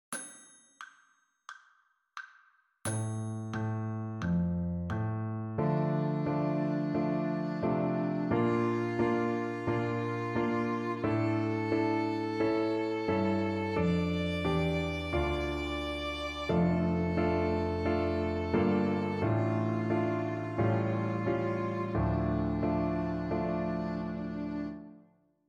D minor arpeggio

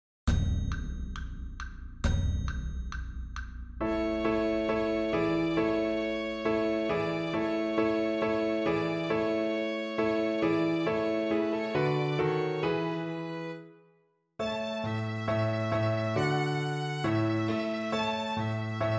Vivaldi Autumn; Book 1

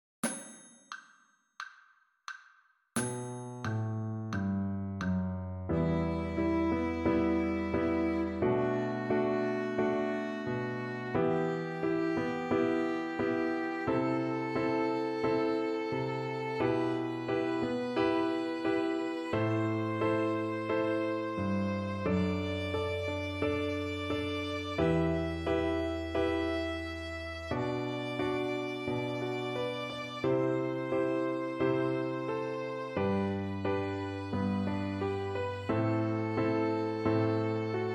E nat minor scale

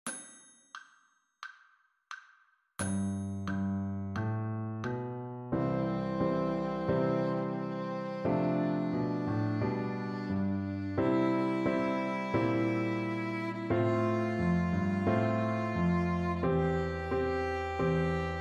C major scale

